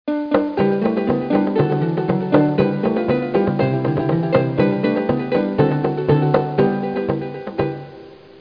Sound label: Anthem